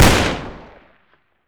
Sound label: de fire, pistol deserteagle; garrysmod